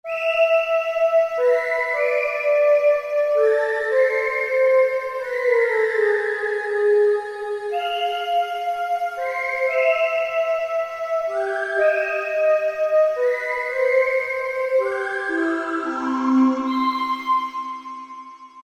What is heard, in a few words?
Whistle Vox; World; Roland SR-JV80-05